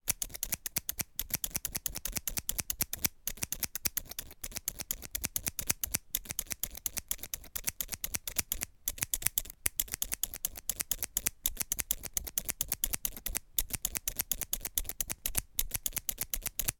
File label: scissors thinning cutting close up, household